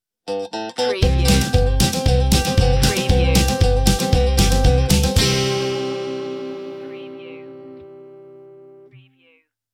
sting

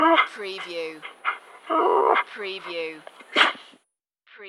Dog Whine Sneeze Vintage

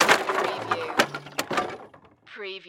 Crash Wood Drop 2x4

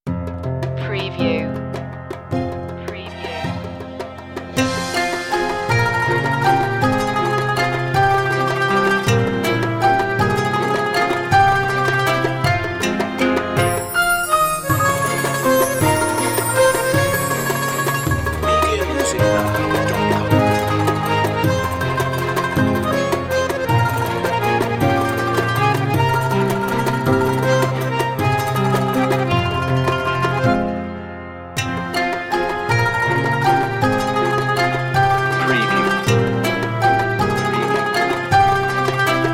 world valse

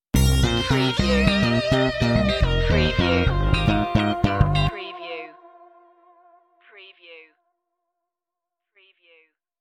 funky stinger

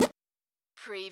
CARTOON SQUEAK

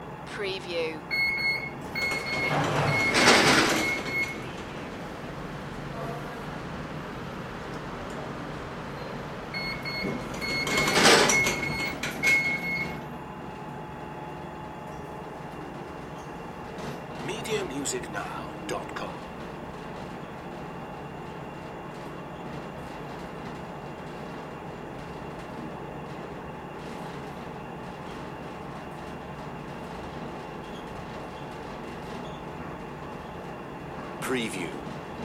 elevator ride loop

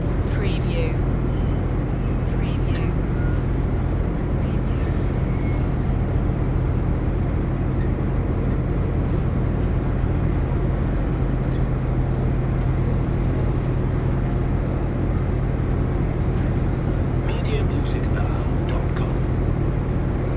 ferry ride ambience loop

